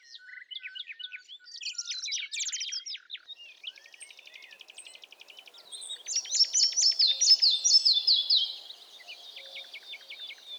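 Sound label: red-breasted flycatcher, nature sounds; malaya muholovka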